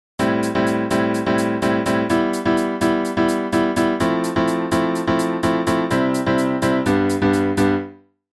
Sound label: Piano dry